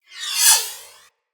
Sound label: Swarm Cannon Projectile By; Weapons; Corpus